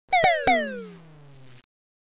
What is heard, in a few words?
Galaxy A12 Notification